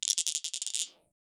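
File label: sound effect of fast energy, digital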